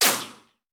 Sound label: Bullet Ricochet; Sound Ideas; Guns